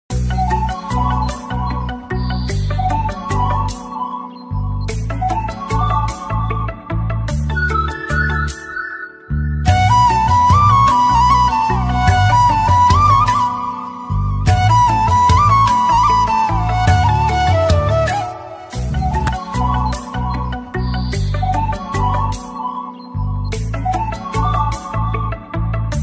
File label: odia ringtone odia love ringtone